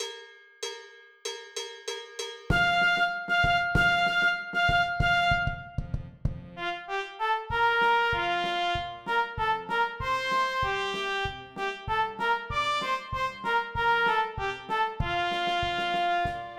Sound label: flute trp